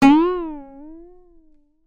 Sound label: comedy spring twang pluck; sound effects one